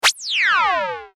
ショット

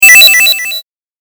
8bit 詠唱

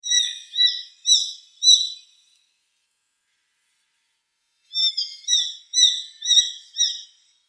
ツミ

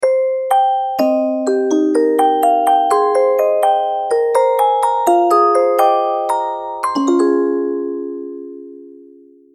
ミステリー オルゴール

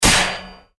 斬撃

